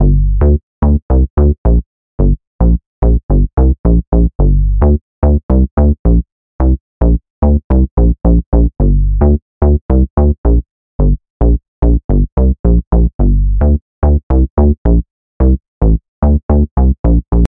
Sine, Bass, Bounce, Catchy Melody, G minor, 8 bars, 110BPM; Audialab EDM Elements